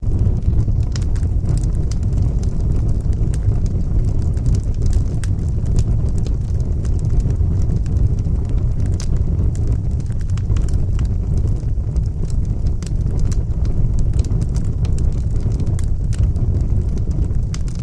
Fire Roaring Loop

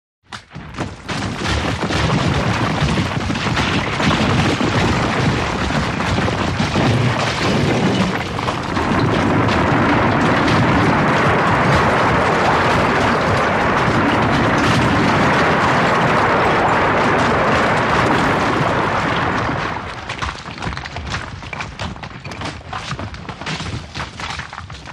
Lrg Rock Slide Dist, rock exterior

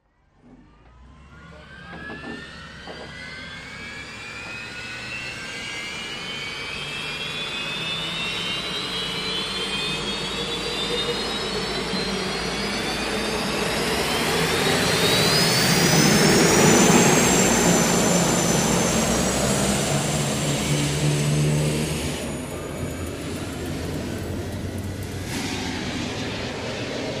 Hydroplane Dock Amb

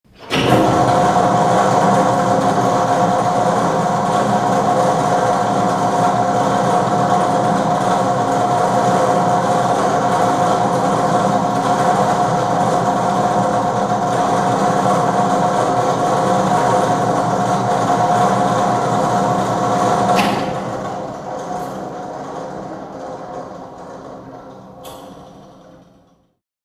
Switch On Machine Run, generator

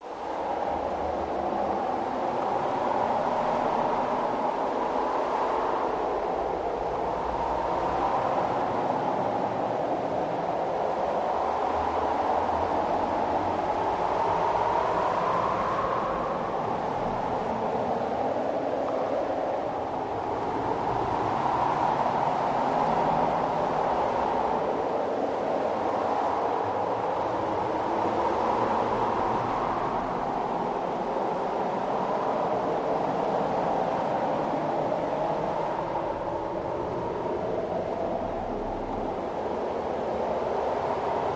Winds Light Wind, air; Titans

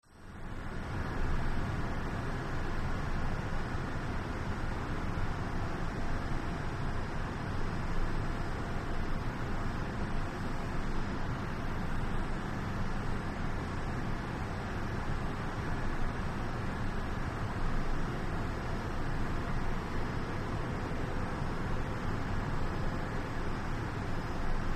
Semi-distant Power Generating Plant, Night-time

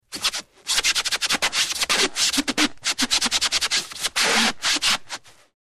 Rubber Squeaks Frict, rubber stretch

